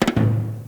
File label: RUN TOM; Crypton; DrumBase5000